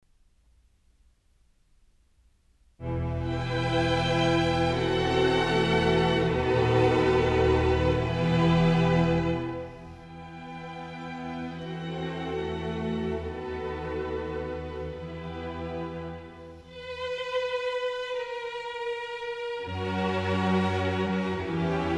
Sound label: Sinfonia, harpsichord; Cantatas; Hedwig Bilgram; Bach; Famous Cantatas II